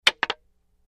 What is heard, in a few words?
Wood Staff Hits Multi